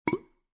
Burp Short Burp Male, Human, Burp, Short Burp, Belch, Male; Burping